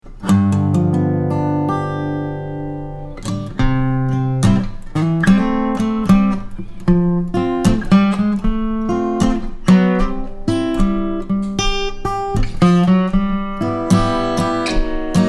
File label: Song Rhythm; guitar lesson